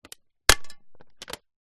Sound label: foley plastic thin press squash stress creak break